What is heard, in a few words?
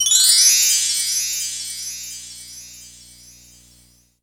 Korg N5 Rock Kit C#3